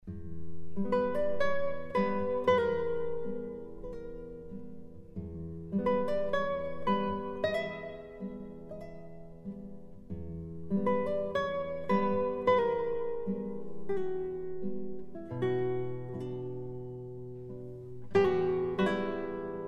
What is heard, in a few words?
Gnossienne No. 1 Arr. for Guitar; Erik Satie